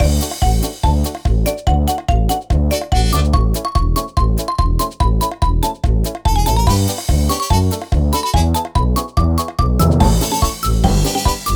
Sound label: BGM Mini Game; Kirei Zukin Seikatsu 2; NDS